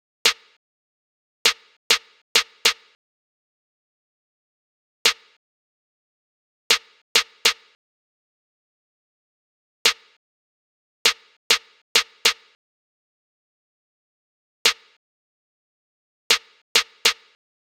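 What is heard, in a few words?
Snare, 100bpm, A minor; HappySloMo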